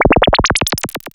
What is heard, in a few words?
SYN FX; X-Static Goldmine 1